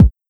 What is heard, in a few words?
Kick TR909